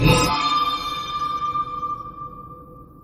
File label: suspense